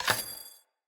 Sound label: Bow Charged Shot Ready; Weapons; Player; Bows; DendritBow